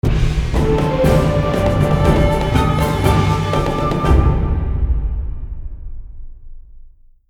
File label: victory short